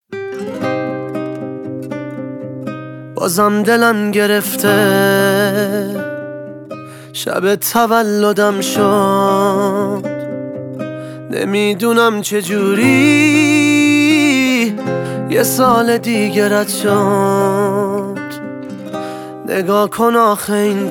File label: happy birthday songs